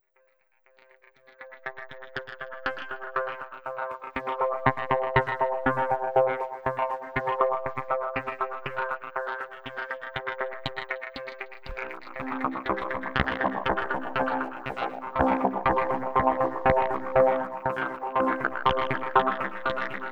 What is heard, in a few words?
Minimal Click Rhythm; Arps & Clicks; Sonic Foundry (Sony Creative Software); Slow Silhouette